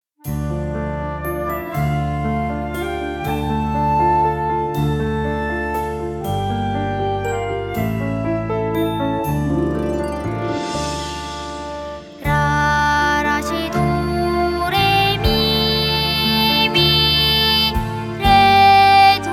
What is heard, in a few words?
scale